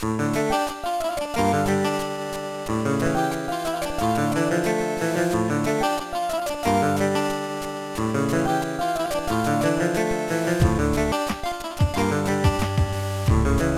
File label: Ending Staff Roll; W.I.T.C.H.; Game Boy Advance